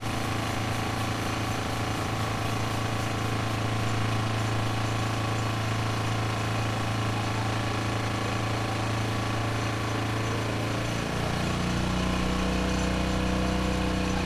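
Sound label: watering, Fukushima University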